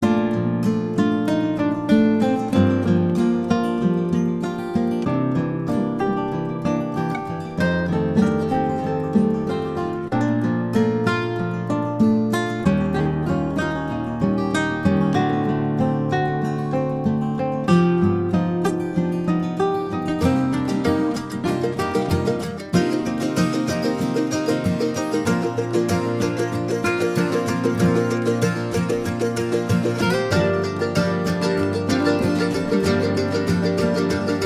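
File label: music for cats, kitten sounds; Muzyka dlya koshek; Zvuki Kotyata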